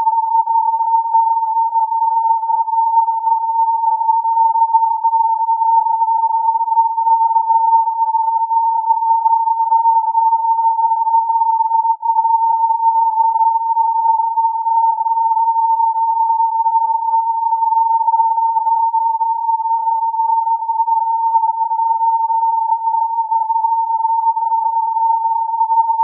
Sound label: filtered morse, 14100 KHz; IBP